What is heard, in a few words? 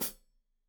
Hat Foot, Hi-Hat, Cymbals Stereo